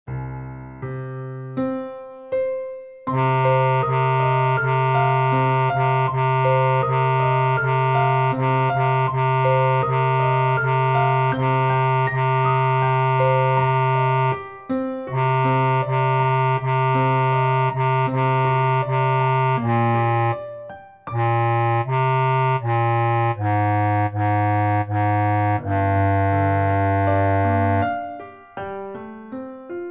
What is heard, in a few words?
Madrigals bass; Three Madrigals 2 Take oh take those lips away